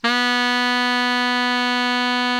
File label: BARI FF B 2, Sax; Giga Samples Collection; BARI 3-WAY